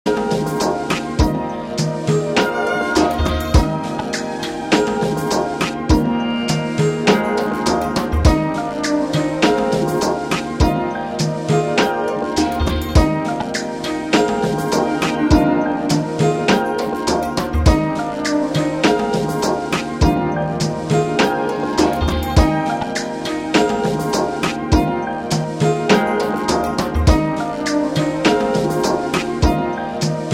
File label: guitar